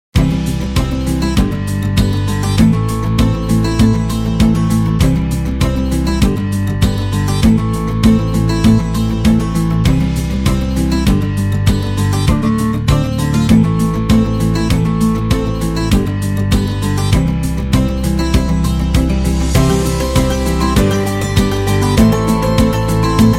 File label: The Guitar